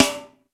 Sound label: 909 TRASHY; E-MU Producer Series Vol. 2; More Studio Essentials; Composer; Technopourri